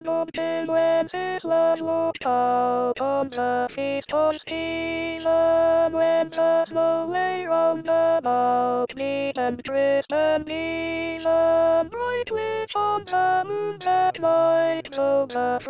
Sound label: alto; Good King Wenceslas